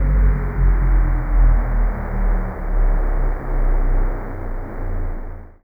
Synth Drive

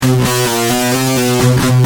G Heavy, Guitar; Industrial

